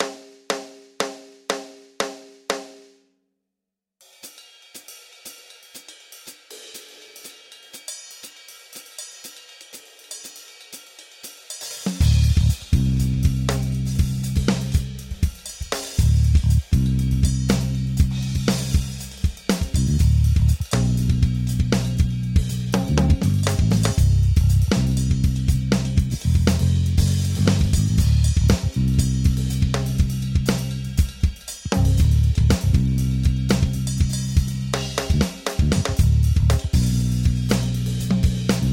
Backing

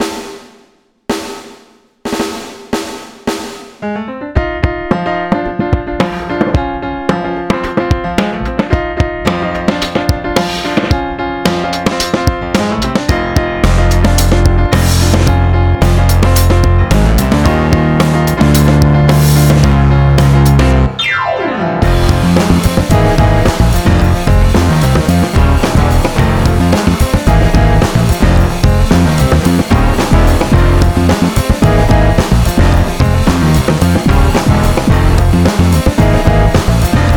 Bombstep

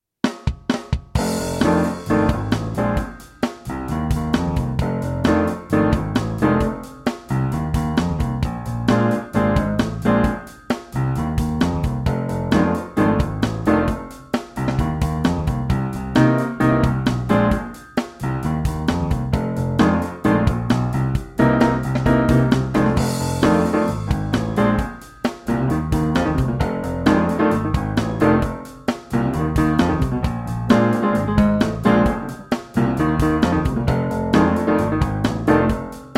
Funk Groove in F

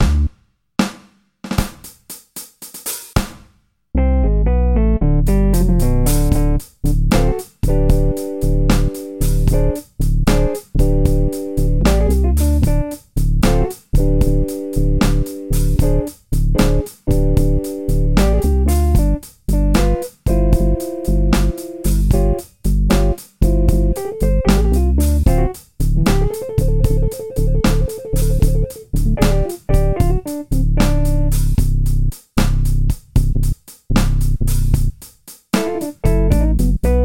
slow blues intermediate backing

